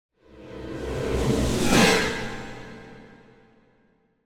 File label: Symphonic Hit Rising Surprise; Hollywood Edge; sound designer's toolkit v2